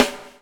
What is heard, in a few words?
BUBBLE; Spectrasonics - Backbeat